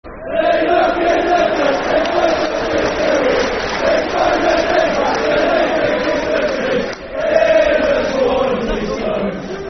Swansea Slums Football Chants (Soccer Songs), Cardiff City; fanchants-com